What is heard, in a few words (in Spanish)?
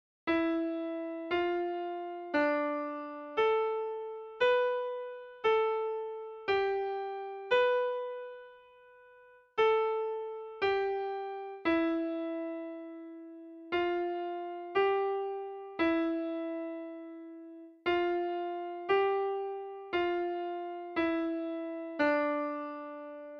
CADENCIA MODAL